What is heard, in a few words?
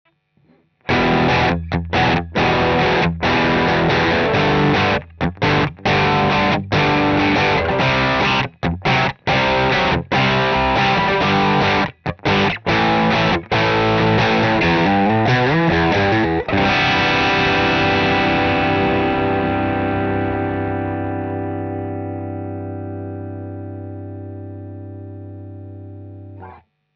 Vintera II Tele Deluxe Crunch